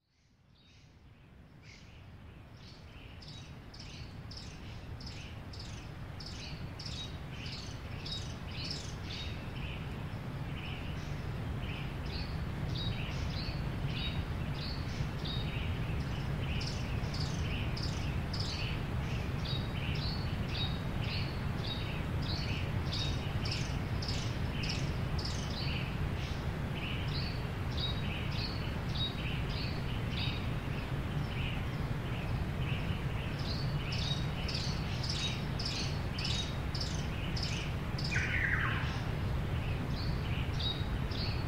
Soundscape Selva Valdiviana

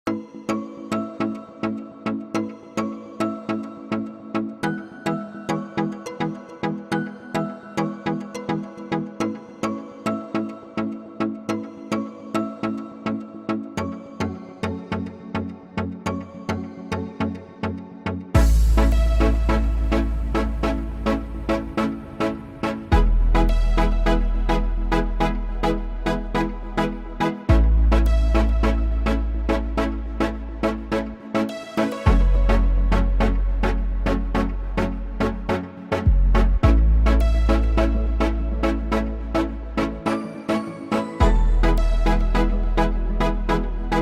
beat insrumental dembow; prod by tavo jimenez

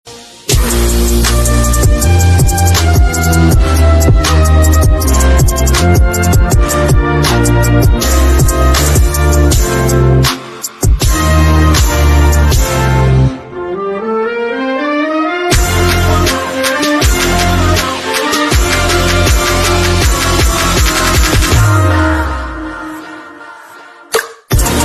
Himno nacional argentino remix

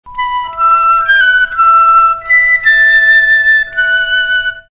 flauta

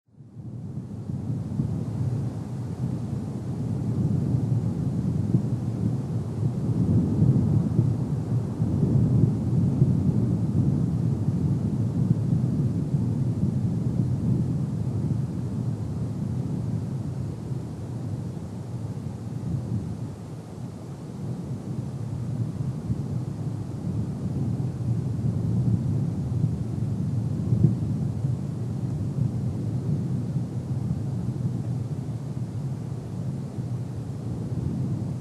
Sonido del aire viento

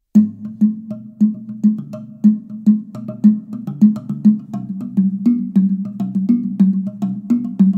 Tambor hendiduras

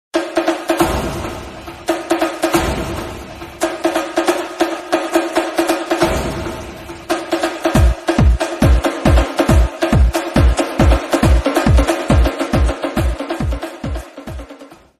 Tambor

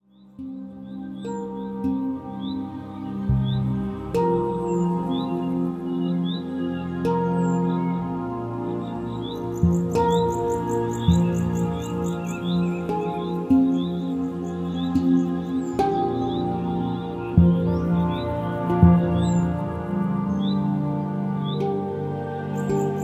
Ruido de Animales y Musica Relajante para Dormir

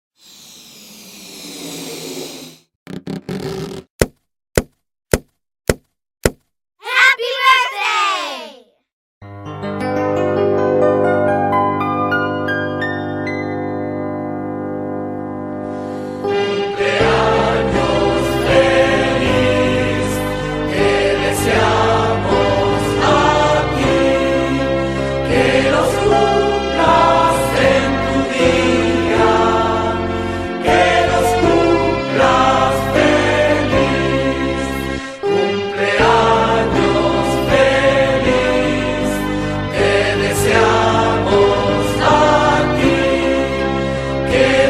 FELIZ CUMPLEAÑOS Versión en Español

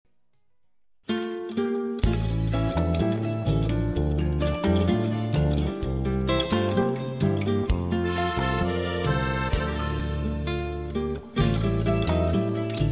Música

